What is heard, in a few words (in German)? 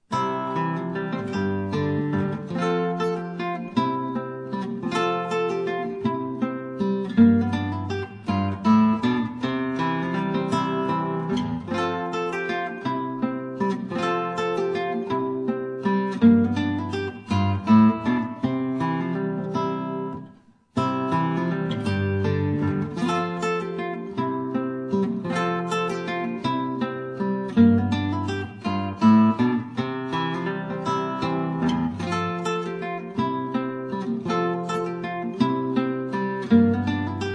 Modernes Menuett, gitarre